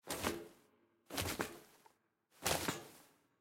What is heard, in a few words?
Gate Climb